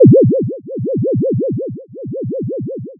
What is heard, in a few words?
cyberboom